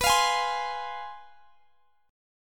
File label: C Major 7th Suspended 4th Sharp 5th, guitar